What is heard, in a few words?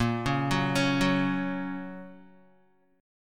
Bb Minor Sharp 5th Bb down Guitar; arpeggiated; JGuitar